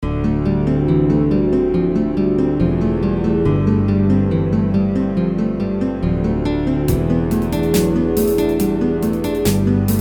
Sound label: Ambiance Guitar Heaven; Synapse